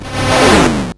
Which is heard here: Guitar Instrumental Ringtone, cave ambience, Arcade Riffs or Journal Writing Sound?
Arcade Riffs